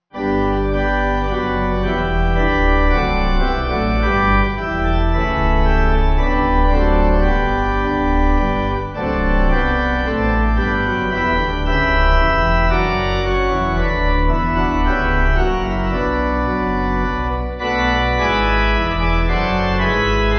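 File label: Pipe, pipe organ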